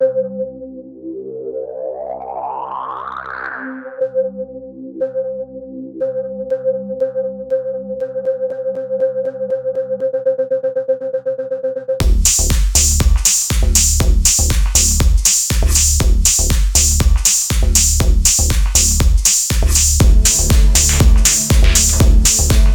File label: Dub house 120 BPM